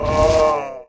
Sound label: hell cast